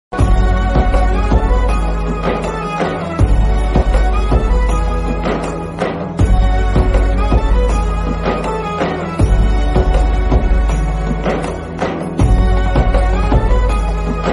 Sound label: bgm ringtone instrumental